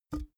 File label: big can empty pick up hard